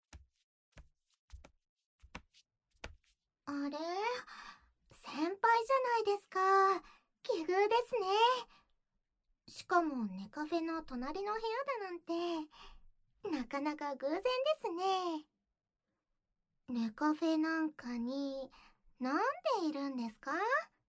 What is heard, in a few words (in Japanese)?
耳舐め囁き; ネカフェで耳舐め囁き手コキえっち